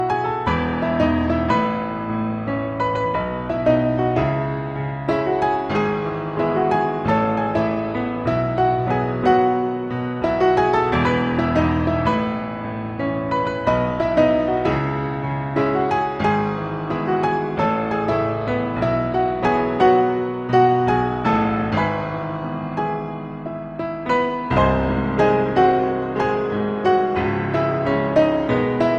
Piano

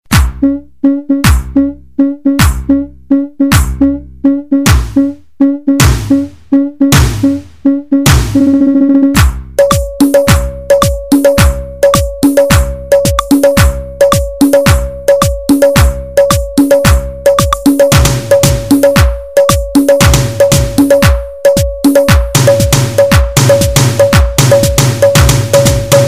music

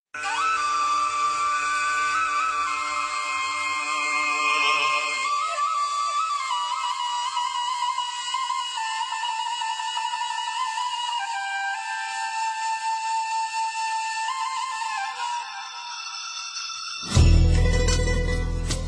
Bamboo; Tenchu

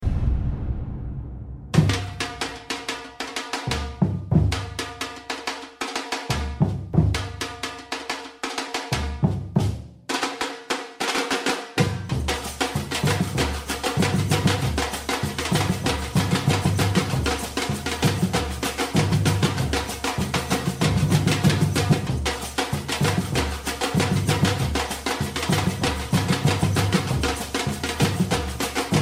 samba

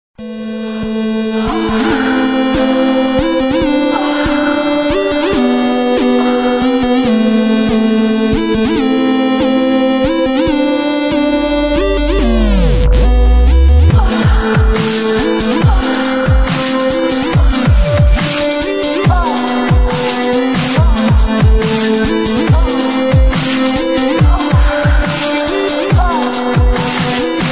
girl orgasm dubstep

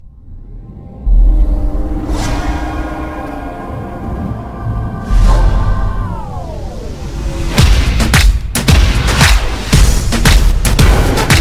lightsaber

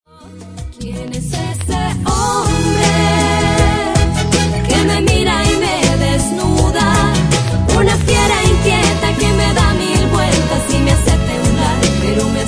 cumbia

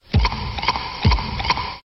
croc note notif

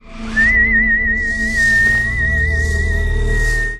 Whistle; Yondu

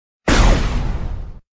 Power Beam

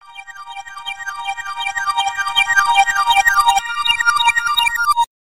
simpletone; ringtones; sms

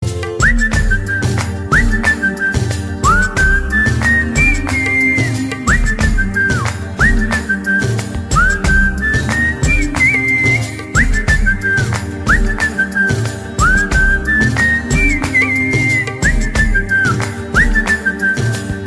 whistle sound; ringtones

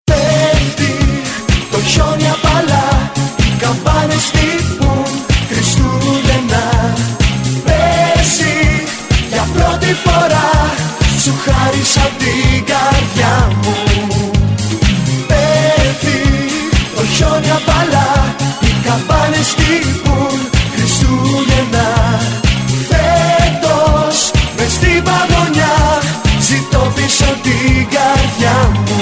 christmas techno; ringtones

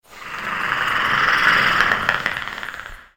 Snarl; Predator

